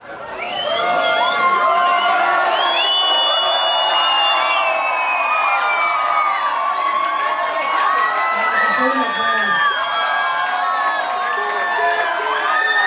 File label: Crowd long applause; people